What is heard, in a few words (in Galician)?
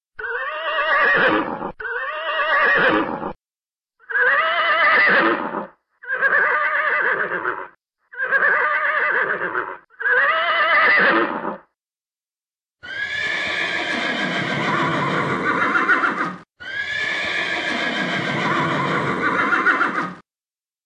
son cabalo; animais